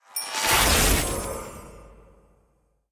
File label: instant Orgasm; spellbound